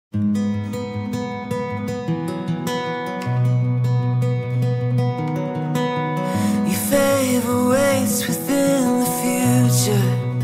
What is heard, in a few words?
acoustic